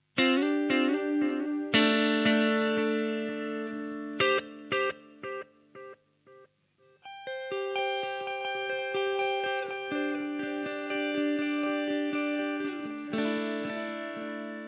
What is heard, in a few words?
analog delay